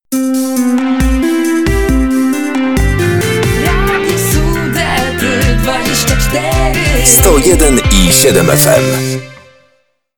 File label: EXTRA VOCAL